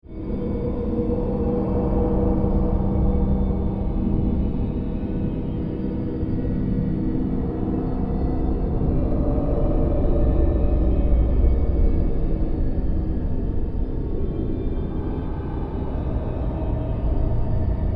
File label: fortress interior